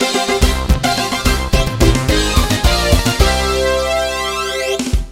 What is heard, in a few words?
Victory!; Megaman Network Transmission